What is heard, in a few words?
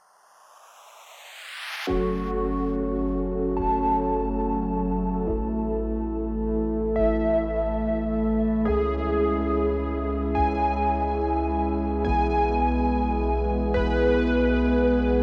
extreme sports dubstep